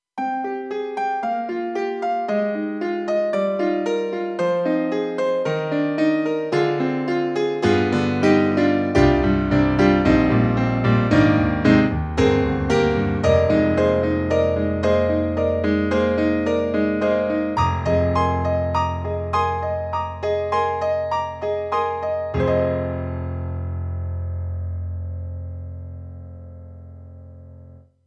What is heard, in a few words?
bright long piano, TRITON Rack; KORG EXB-PCM08